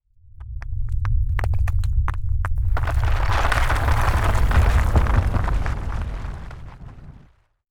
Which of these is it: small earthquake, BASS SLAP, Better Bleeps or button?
small earthquake